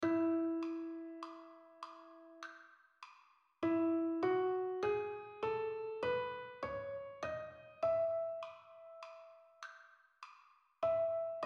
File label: diatonic scale sop low; sop 1; HIGH SCHOOL CHOIRS; diatonic scale 2016; REGION I